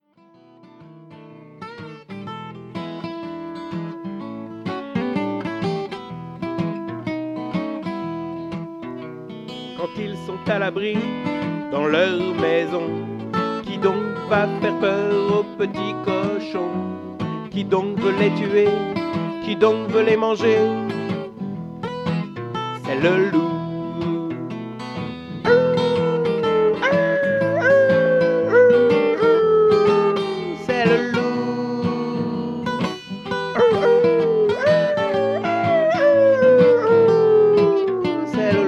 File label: Blues